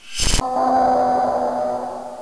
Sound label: death; Curse of Dragor